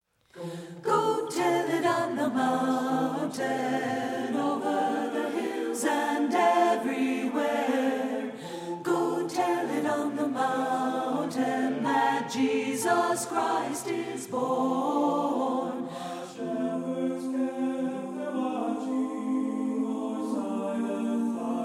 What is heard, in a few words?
ALTO; satb